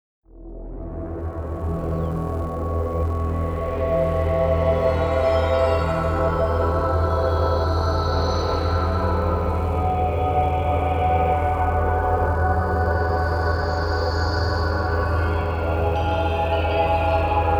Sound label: Healing Music